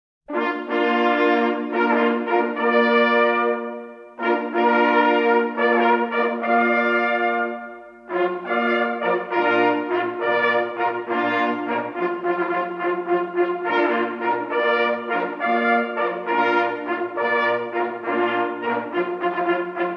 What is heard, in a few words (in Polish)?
kozica na rozkladzie, sygnaly